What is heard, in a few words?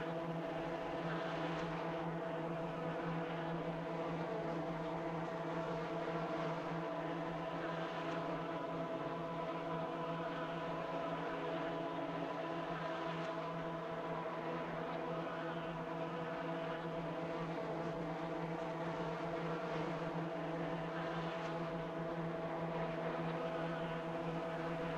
ambiance hive; Fate The Cursed King